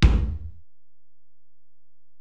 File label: Ac BD, Alesis DM-PRO; 300 Drum Machines